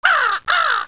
PARROT

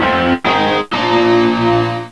Rock Guitar Power Chords; Jim Heid’s Sounds

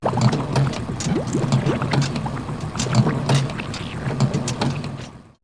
OIL PUMP; buildings

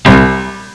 PIANO KEY; STRINGS

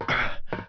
ladder; PC Gamer IT CD 41; Daikatana

